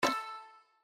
Spin Stop; Kungfu Showdown

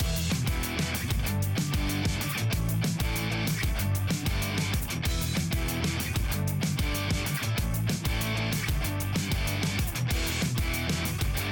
BGM Bonus; Victory Lane

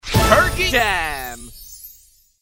Reel Spin Stop Special; Thanksgiving Blast

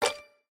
reel stop, slots; Fruit Fiesta

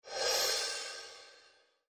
BONUS COIN INTRO; slots; Magic Coin

